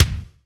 trebly kick drum sound D# key, Royality free kick single hit